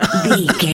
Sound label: voice male coughing